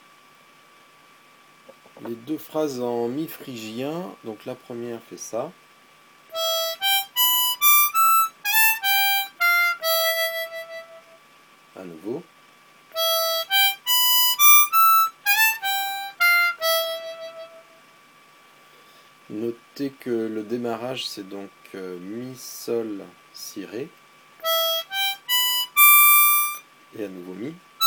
e phrygien 2 phrases

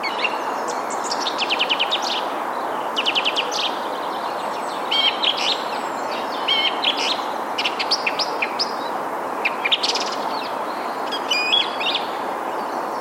grive musicienne friche; ville evrard

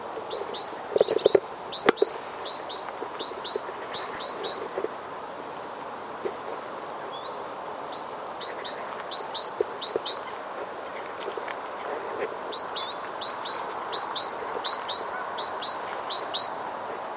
mesange charbo

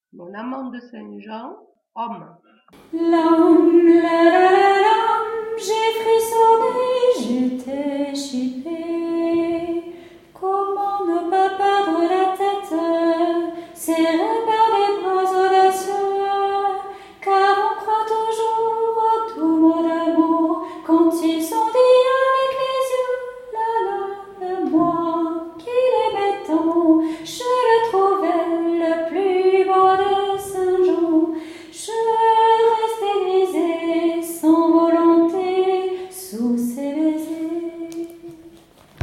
homme

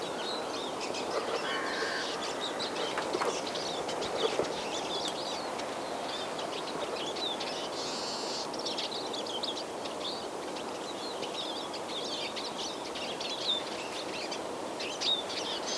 tarins aulne

